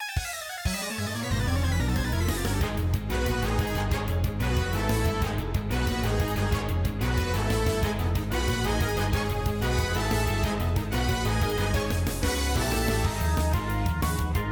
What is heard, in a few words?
battle; galactic grunt; pokerogue